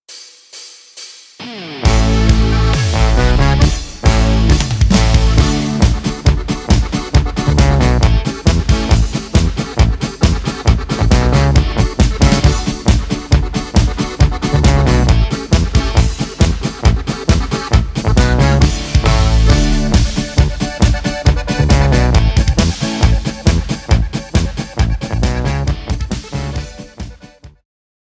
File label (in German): A D G C